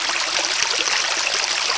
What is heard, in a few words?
water; zelda